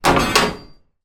metal rod lean against metal wall; zapsplat

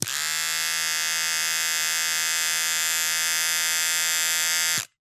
Electric Razor Turn On Run Turn Off

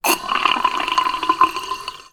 household bathroom basin tap turn on very lightly

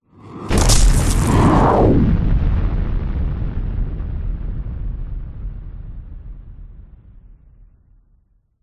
cinematic hit explosion debris whoosh; sound design

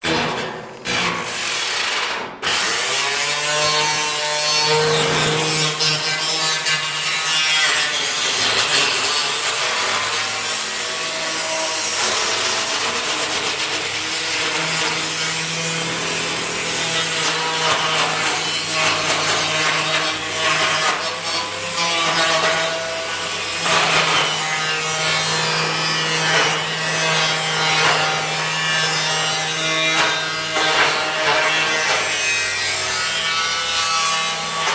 industrial angle grinder med long

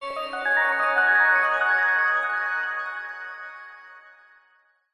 fantasy magical musical glisando; zapsplat

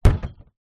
impacts plastic bin large; zapsplat

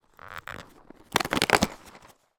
food chocolate maltesers box open

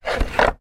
foley wood blocks small slide together; zapsplat

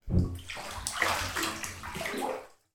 household bath body move rub water splash

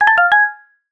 multimedia event tone

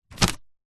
paper sheet a4 grab pick up; foley

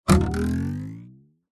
cartoon elastic stretch expand

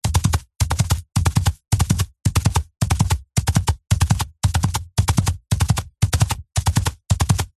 horse running galloping grass

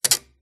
Stress Creak Squeak Groan; PMSFX

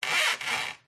Wood Stress Creak Squeaks; DPA4060; pmsfx

